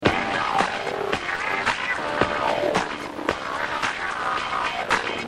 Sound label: tape few bins